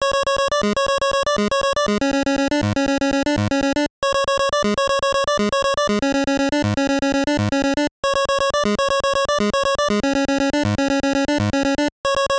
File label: Level Intro; Orb•3D; NES